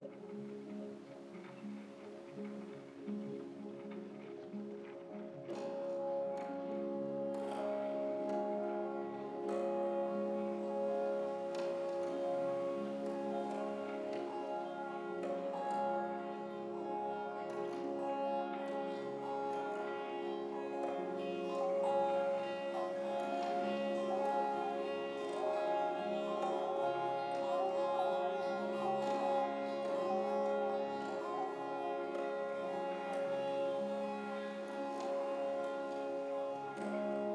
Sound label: Arcade Stems